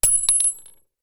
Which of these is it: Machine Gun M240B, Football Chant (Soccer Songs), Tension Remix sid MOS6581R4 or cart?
cart